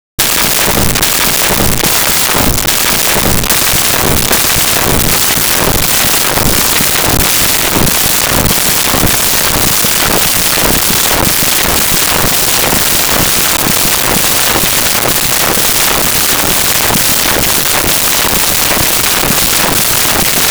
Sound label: heartbeat speeding up